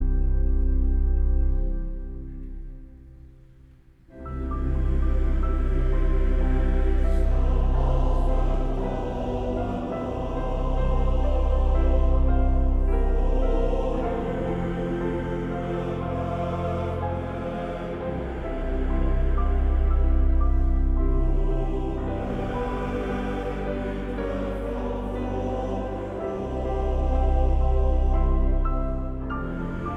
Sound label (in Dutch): Koor gemengd